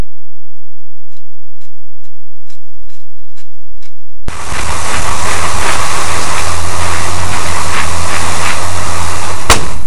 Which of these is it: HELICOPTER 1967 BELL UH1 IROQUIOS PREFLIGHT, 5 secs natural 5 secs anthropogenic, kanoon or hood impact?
5 secs natural 5 secs anthropogenic